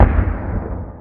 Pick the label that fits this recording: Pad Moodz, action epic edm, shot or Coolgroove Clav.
shot